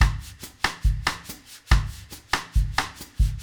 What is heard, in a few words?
Drums, Jazz